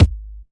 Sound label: prominent kick g sharp key